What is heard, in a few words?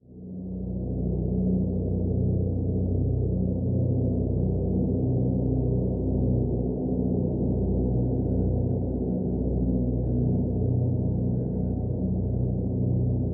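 Drone Wind Farm Simple B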